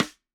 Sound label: Rim Sidestick Open